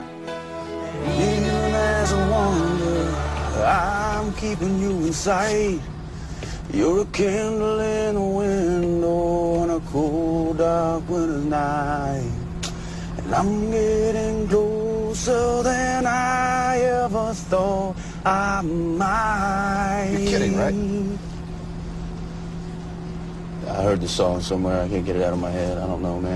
singing

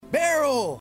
Barrel; Klein

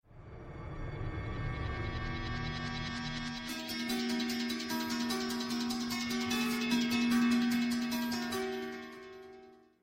sms; Saw

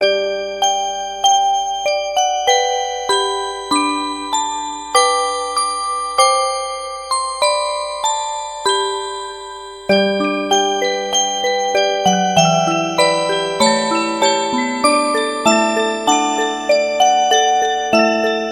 Xmas Ditty

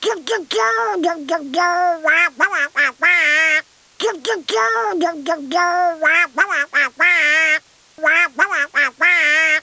duck

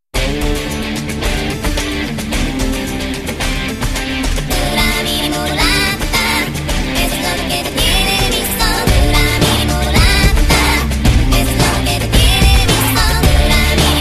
Symphony Music

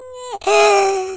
gasp

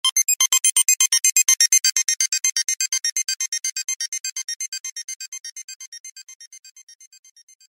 Sms Computer

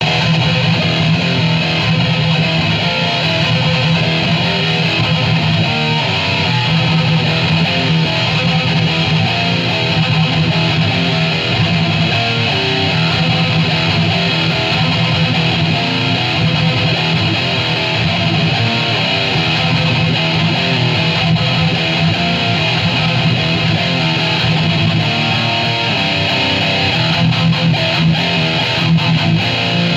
METAL

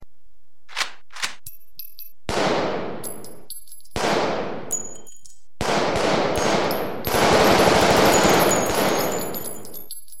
Gun Shooting

